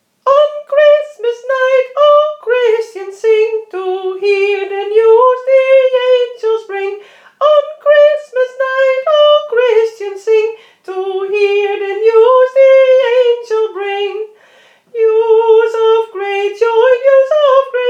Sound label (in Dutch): sopraan